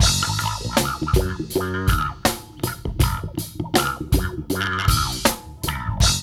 LOOP

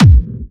Clubby Kicks, Bassdrums; Vengeance; Essential Clubsounds Vol.4

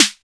606 BRT SNR, HOUSE KIT; Drums In Your FACE!

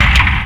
GEAR HEAD; MACHINES

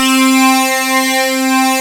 THICK SYNTH; AKAI S-Series CD-ROM Sound Library VOL-8; S-PAD

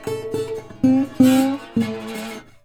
Blues Guitar Samples; ILIO - Fretworks

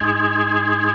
B3, First Call Vintage Keyboards

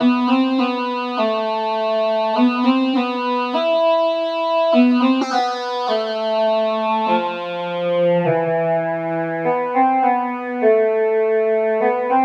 East Indian Melody Lead; Trip-Hop; Loops; Sonic Foundry (Sony Creative Software); Rondo Brothers Trip-Hop Loops & Samples; Liberation

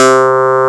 CLAV C3; Big Fish Audio; Synth City

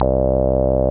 BAS, Eurotech; Sounds Good

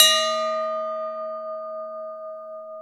CHIME; AKAI S-Series CD-ROM Sound Library VOL-2

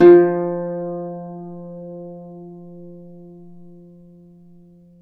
Celtic Harp, Plucked Strings

